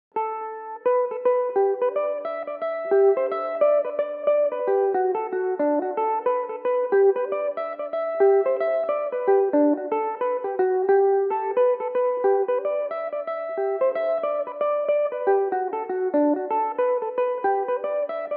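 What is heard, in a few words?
G major, Irish mandolin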